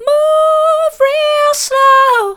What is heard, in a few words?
move real slow soft, vocal